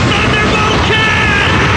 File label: thundrbolt